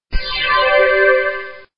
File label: small medkit; wizardwars